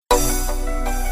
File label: pain with betrayal